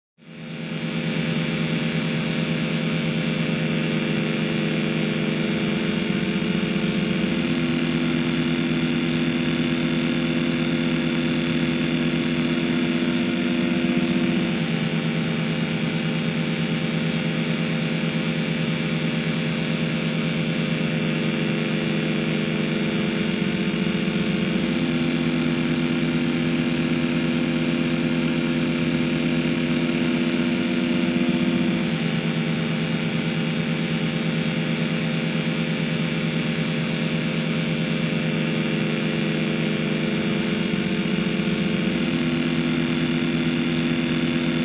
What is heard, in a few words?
Elect Energy Field, buzz